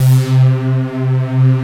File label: BIG FUZZER, DANCE; Pro-Rec